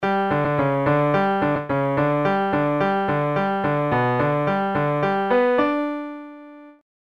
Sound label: PIANO